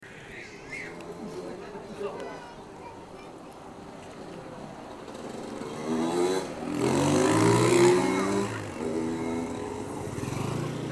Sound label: motorbike